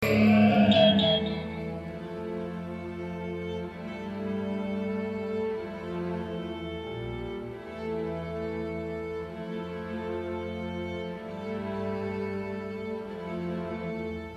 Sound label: classical